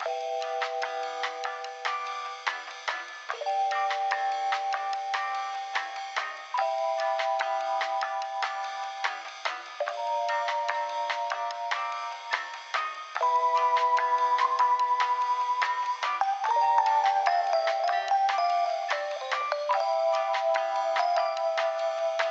Waiting Room Elevator Music Loop; hunkenstein windows gamerip 2022

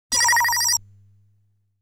Enemy Fires a Bullet

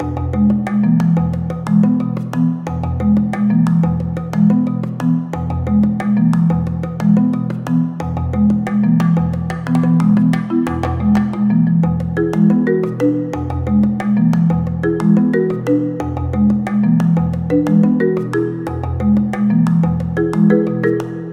Strange Melody; hiberworld android ios online windows gamerip 2019

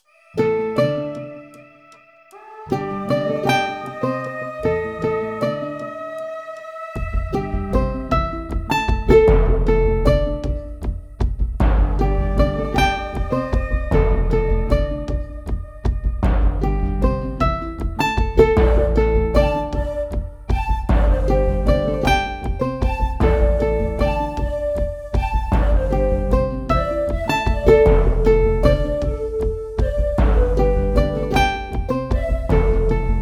Woodling Waltz; Turnip Boy Commits Tax Evasion

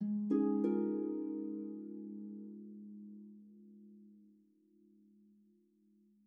Calm Harp; super mario maker 2; Peaceful SFX Raws - Otoasobi

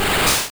Barrier Single; sfx; attack moves; pokemon; gen 1; rby

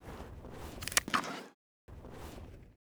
Pipe; Baxter

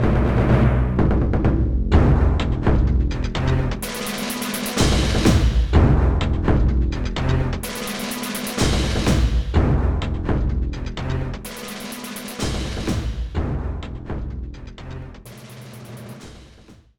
BGM Combat; District 1

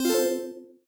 Panel Dressup Correct; Among Us

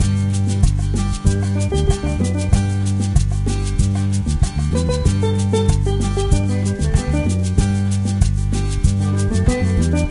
Cruise Music; Diner Dash: Flo on the Go; NDS

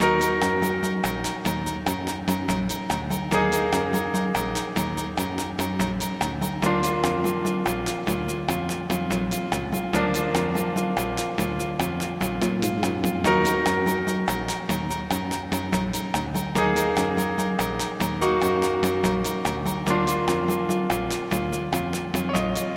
Samba

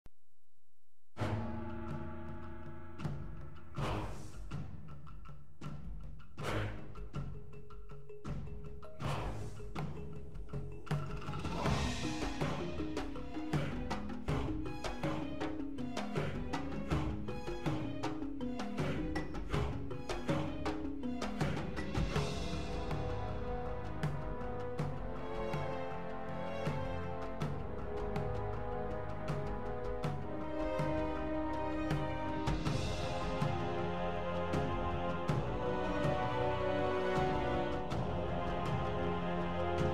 Drum Jungle Theme; Zafaria

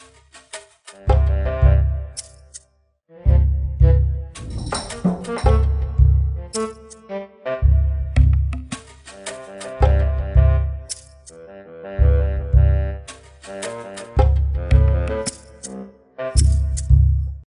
Jungle Level

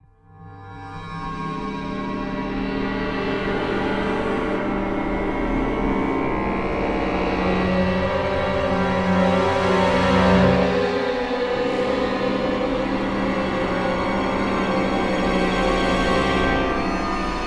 Music Danger Alert Clusters Only; starcraft 2 wings of liberty; MissionMusic

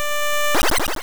Spider Web

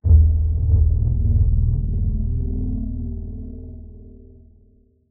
Drum One Shot

